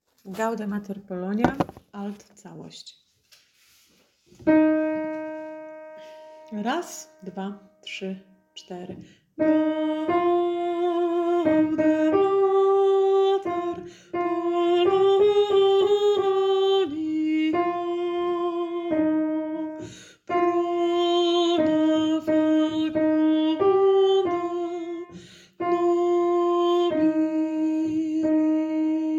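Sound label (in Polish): Całość Alt; Gaude Mater Polonia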